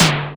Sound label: Noise Tom; Roland MV8800; SONIQ DRUMS